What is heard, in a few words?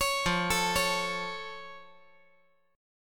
F Augmented F